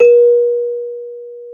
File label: BEL CELES; Roland - LA Composer vol. 1